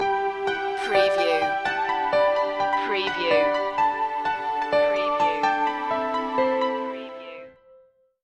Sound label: spin me around stinger